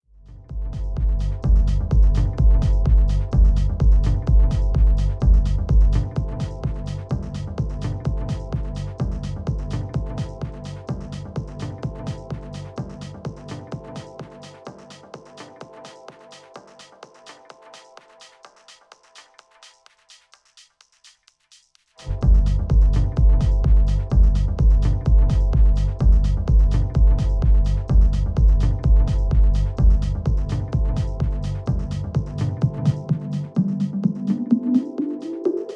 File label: Pioneer DJS 1000 HPF